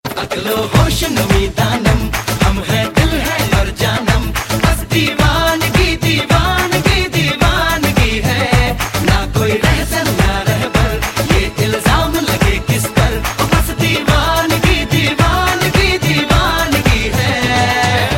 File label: best indian ringtone